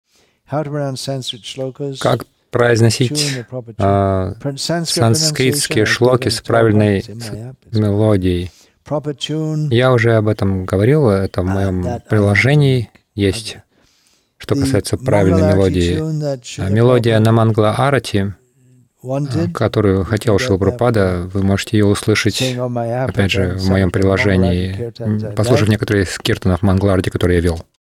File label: Correct Tunes for Sanskrit Shlokas Mangala Arati; Prabhupada